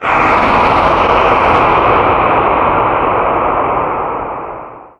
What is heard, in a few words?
male scream; Garry's Mod; tf2